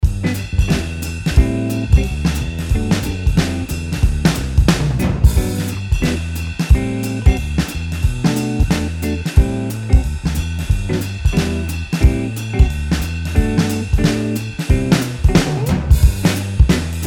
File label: improvising backing